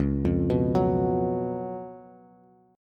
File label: C sharp 11th C sharp, Bass; arpeggiated; C#11; JGuitar